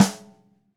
Ludwig Supraphonic Snare FF Room; Sonic Foundry (Sony Creative Software) - Vital Drums

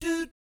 DOOT E4, Jazz; ILIO; Vocal Planet; Jazz & FX; JAZZCOMBO

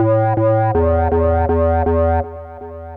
synth, Hardcore Breakbeat 161 Bmin; Big Fish Audio; Hardcore Breakbeat Action

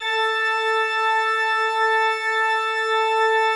A3, Cathedral Organ; ILIO; Propeller Islands Cathedral Organ

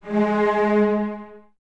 Cellos, String Ensembles; G#3; Miroslav Vitous; LINKS ORCH

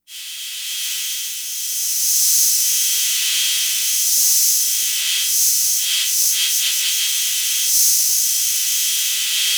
FX, Drum Machines; Fricke Schlagzwerg

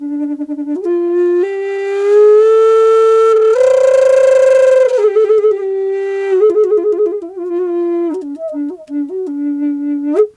D wild flute

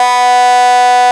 RAVE BEEP; Best Service Alpha Dance vol.2

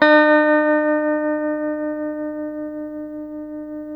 TELE TREM E, Guitars, TREMO; Spectrasonics; Hans Zimmer Guitars VOL-1; TREMO-LUXURY

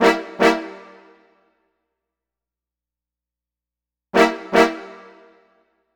Brass Stab; Synthetic Winds; Dr. Fink's Funk Factory

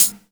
HH, Dance Mega Drums; Best Service; HH-HIP

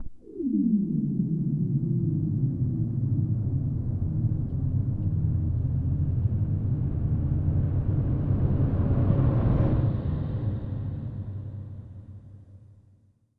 G3 torpedo; NorthStar - The Wizard VOL-2; BRAIN WATER

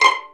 PIZZ VIOLI; E-MU Formula 4000 Series Vol. 5; Protozoa; Proteus2 Instrmt

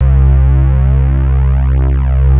MIDI pad, synth pad; InVision Interactive; Lightware VOL-1; Instruments & Percussions

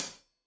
Hat Tight, Hi-Hat, Cymbals; Deeply Sampled Drums

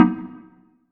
Chord; ModelCycles

